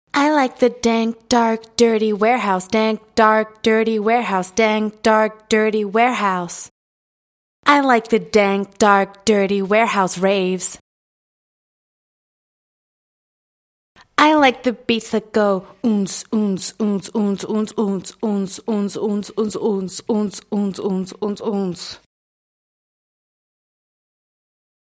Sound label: acapella, pop 135 Gm